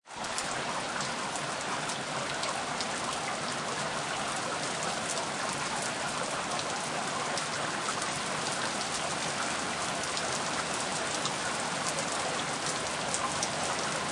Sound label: Rain On Street